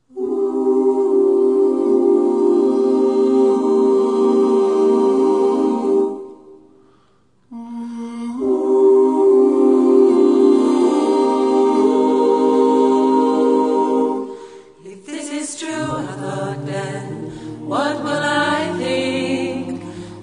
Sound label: ssaatb